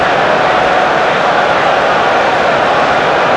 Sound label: Large Idle, Crowd; David Beckham Soccer; XBOX